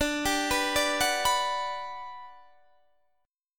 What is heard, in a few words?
G Major 7th, D